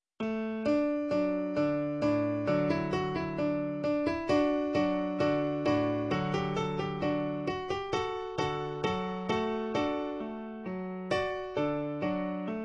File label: klavier